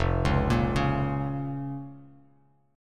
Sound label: F Suspended 4th Sharp 5th F Bass Standard; arpeggiated; 1,4,8,6; JGuitar